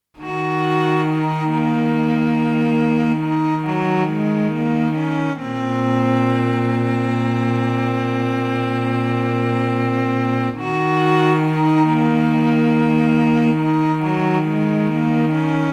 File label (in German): streicher